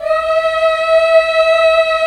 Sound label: FEMALE HUM E4, ENSMBL; AKAI S-Series CD-ROM Sound Library VOL-8